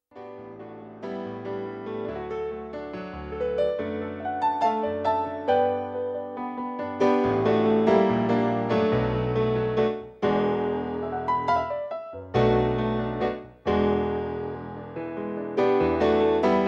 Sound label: Piano